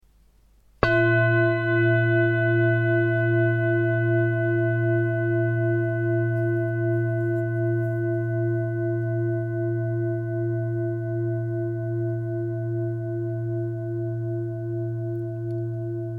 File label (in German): Klangschale